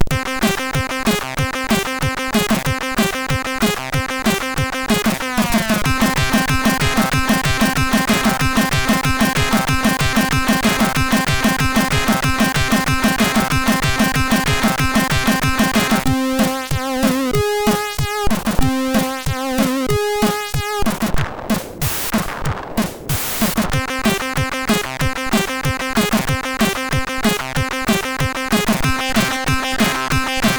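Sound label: Techno, MOS6581R4; Techn0-2; hvsc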